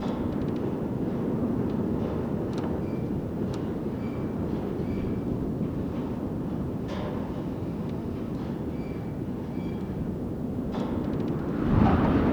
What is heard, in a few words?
ambience wind